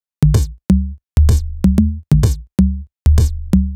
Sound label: Swallow Top C# 127bpm, House Drumloops; 90 s Sample CDs; Zero-G House Fabrik Drumloops; ZG Drumloops